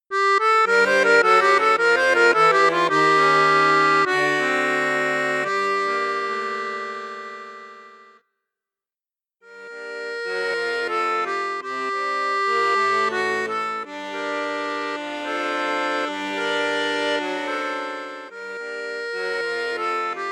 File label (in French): registre basson, accordeon; crucianelli 25